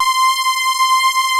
POLY BRIGHT; Pro-Rec - Synthsonic

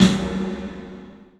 PA DEEP L, CLUB SN VRB; 3D Sound; 3D Drums

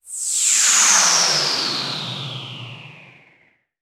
TELEPORTER; ILIO; Trance Fusion

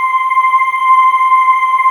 digi choir C6; Best Service Dream Experience; DIGICHOR C6

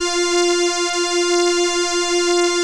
ELKA, String Machines

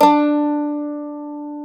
mandolin, Stereo Mandolin; E-MU Producer Series Vol. 2; More Studio Essentials; Composer

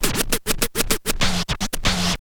scratch kit, vinyl; Masterbits; Vinyl Attack

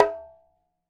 Requinto Hit, Latin Percussion; Sonic Foundry (Sony Creative Software); Joe Vitale Latin Percussion

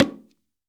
STEREO BONGO

